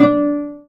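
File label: cello pizzicato A; AKAI S-Series CD-ROM Sound Library VOL-2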